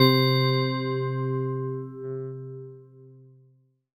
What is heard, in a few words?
VS BELLI; Synclavier Sampler Library; KEYS AND GUITARS